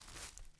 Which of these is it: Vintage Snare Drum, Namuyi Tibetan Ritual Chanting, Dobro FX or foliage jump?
foliage jump